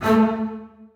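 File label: basses staccato a1; Sonatina Symphonic Orchestra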